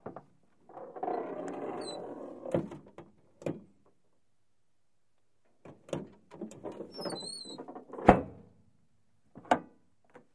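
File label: Buick, Trunk Open and Close close up, Squeaky, X2, antiques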